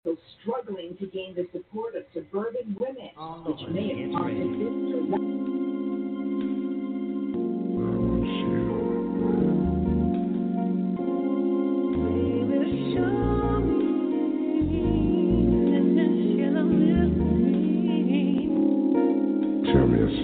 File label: rb love making music; after dark; 60s 70s 80s